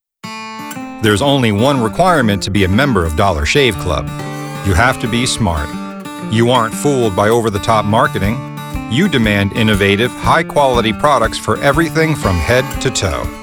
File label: corporate